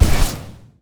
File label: big jump; garrysmod